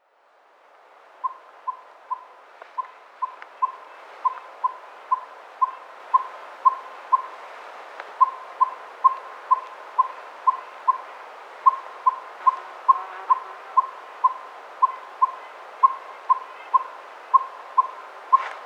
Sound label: Northern Saw-whet Owl - Aegolius acadicus acadicus; birds